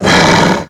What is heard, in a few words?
death worg; dota; creep deaths